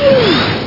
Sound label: fx slide